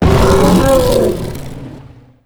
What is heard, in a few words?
death